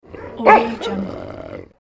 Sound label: dog growl